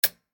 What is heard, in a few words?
Button Meh Find; Game rip; MiSide